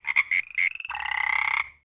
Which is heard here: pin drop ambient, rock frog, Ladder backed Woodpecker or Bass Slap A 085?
rock frog